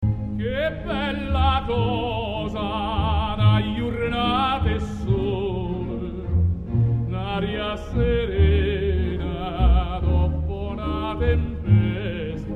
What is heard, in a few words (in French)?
O Sole Mio tenor clair; En tenor, mais en augmentant le son de l'orchestre